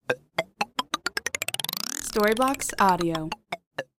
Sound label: wheel spin